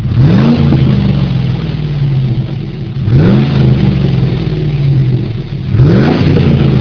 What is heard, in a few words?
chevy tahoe 5.3l split rear; Chevy/GMC